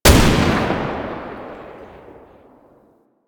Belt Fed Rifle Shot; Weapons; Warframe; Tenno; Frumentarius

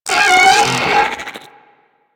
Vocal Attack; Warframe; Corpus; Arachnoid Wraith

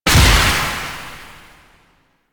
Projectile Death Tech Medium; Weapons; Warframe; Duviri; Incarnon Adapters

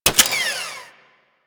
Mech, Weapons; Warframe; Tenno; Miter

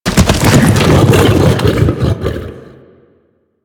Charge Ability Foley B; Warframe; The New War; Archon; Amar; Abilities

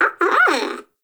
Baby Vocal; Nokko; Warframe; Nokko Colony; Find Nokkos

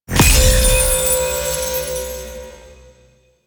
Crystal Acitvate; Warframe; Cephalon Weave; Weave Boss

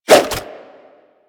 Nunchaku End Whoosh; Warframe; Tenno

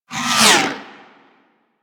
Grenade Launch Whoosh; Warframe; Grineer Ghouls; Ghoul Expired; Attacks

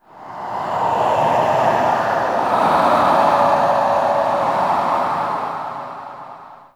Death Wind Gust Low; Ambience; One Offs; Red Night

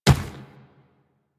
Infested Medium Run Dirt, footsteps; Warframe

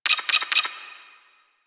Briefcase Launcher Homing Projectile Warning; Warframe; Weapons; Corpus

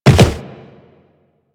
Land Soft Wood, Footsteps; Warframe; Operator

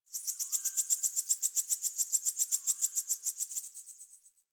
Tree Critters; Ambience; Props; Warframe; Duviri

